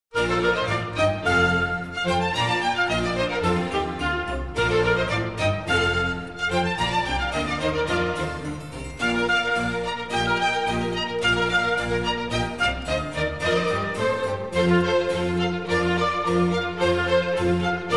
Concerto For Oboe and Violin in B-Flat Major, Largo